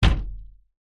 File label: household wardrobe door close freestanding wooden